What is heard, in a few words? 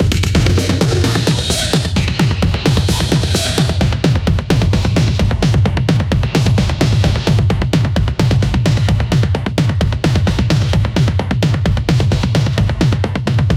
powerful percussion only, tom